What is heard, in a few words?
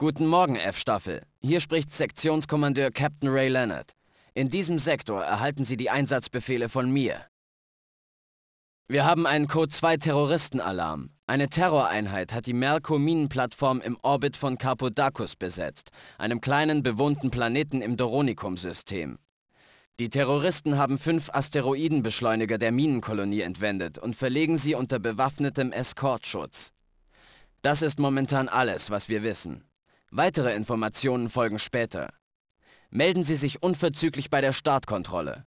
military; Team17; Phoenix Demo